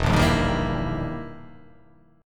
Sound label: E Minor Major 7th Flat 5th; chord shape